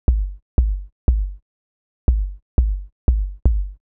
Kick Low; hydrogen

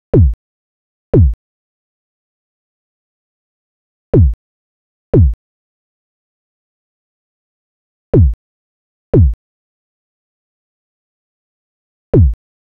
kick; hydrogen

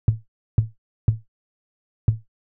Tom Mid; hydrogen

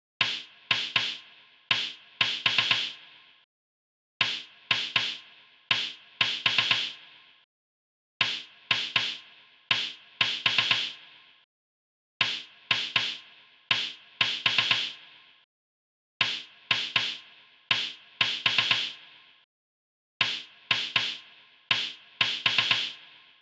snare; hydrogen

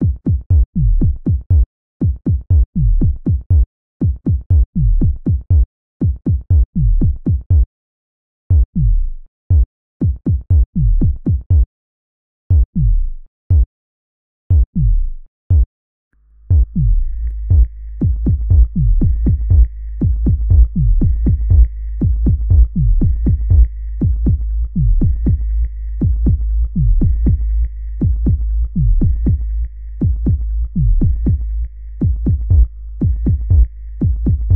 drum; hydrogen

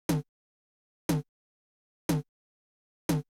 TR909 Dst Tom; hydrogen

